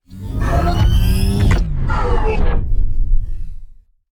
Low Warped

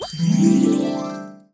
Level Complete; Candy Crush Soda

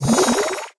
Full Stamina

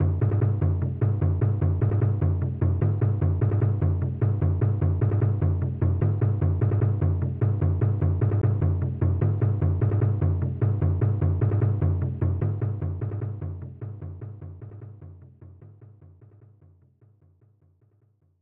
Jungle Rhythm; Pitfall The Mayan Adventure; SNES

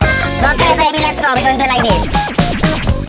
Battle Mode Stage Start

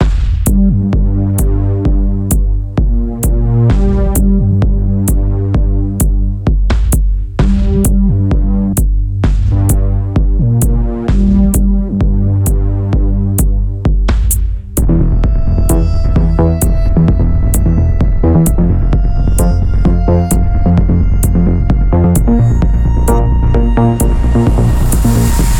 Tense; everspace 2

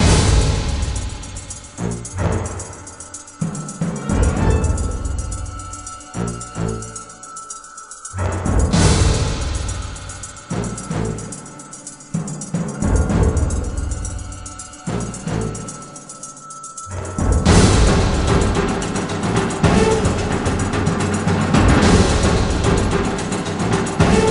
Suspense; Nancy Drew: The Model Mysteries; NDS gamerip

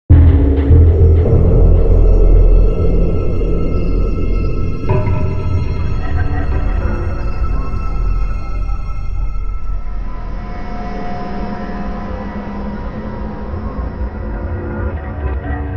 Hunt - Ambient; Warface

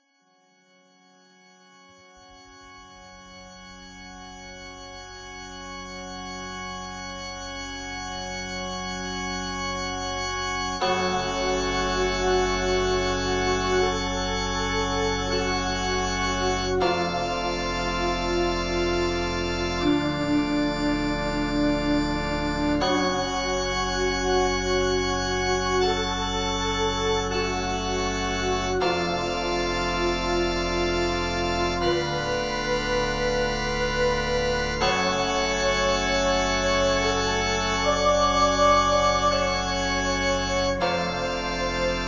Ambient Church Spooky

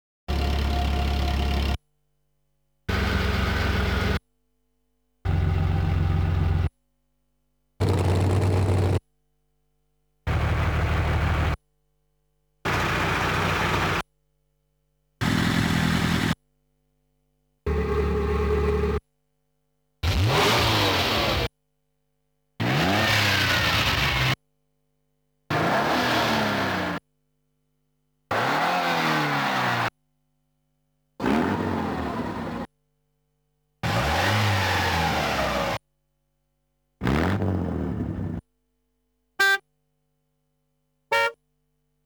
S.E. Collection; over top oshidashi zintrick gamerip

